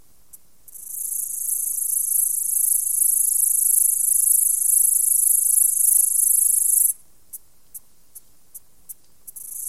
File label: Orchelimum gladiator; Arthropoda